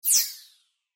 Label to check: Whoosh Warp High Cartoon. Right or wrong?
Right